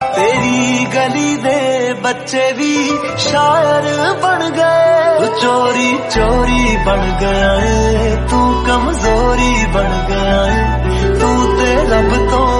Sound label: punjabi song